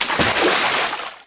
Bodyfall Deepwater; SFX Kit; Bodyfalls

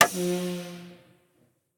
Semi Truck Brake; Large Vehicles; Blastwave

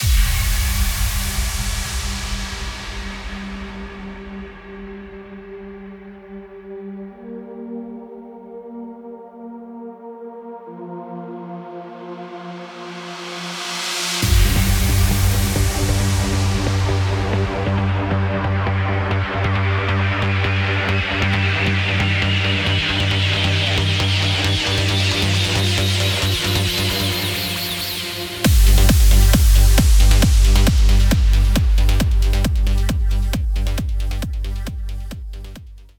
Arcade Theme; Pitch Black Audio Pong

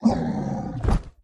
Undead Abbot Heavy Attack; Blind Quest - The Frost Demon

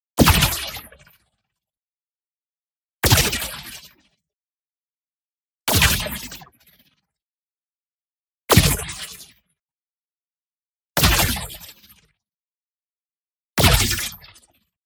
IMPACT SMALL Voltage Discharge; boom library; Matter

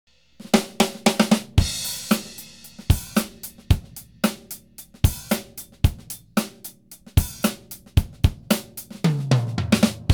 Whole drum kit (stereo); Alan Parsons & Stephen Court - Sound Check 1993

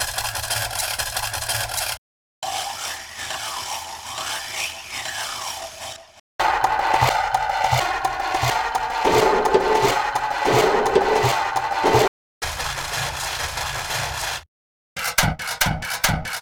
fx clock loop mix; best service Blue Box; mixed sounds long

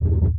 bottom bump; Blind Drive

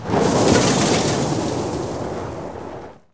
Auto Tires Skid Gravel; Automobiles

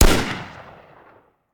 Rifle; Sound Ideas; Guns

